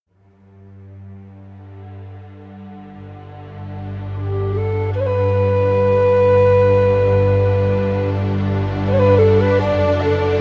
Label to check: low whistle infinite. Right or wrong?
Right